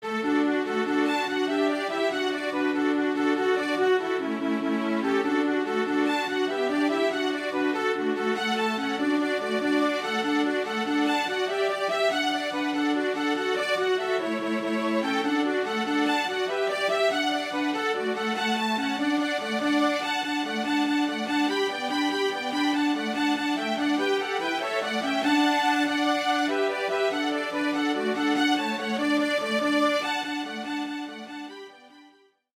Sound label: Three Irish Jigs Violin Trio